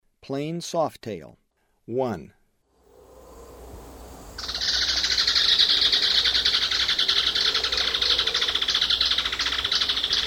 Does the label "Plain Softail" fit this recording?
Yes